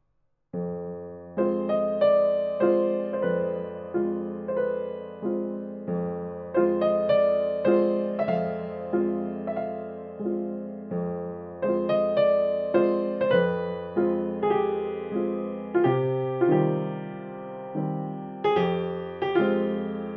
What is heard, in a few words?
steingraeber, akoustikpiano; mp3 akoustikpiano